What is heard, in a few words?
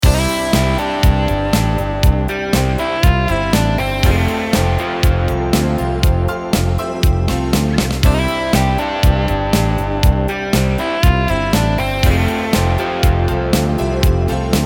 Nostalgie Drums